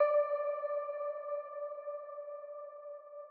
Crystal D6

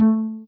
soft hitwhistle; osu

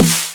soft hit clap

